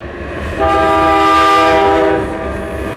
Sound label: short loud train horn blast; trains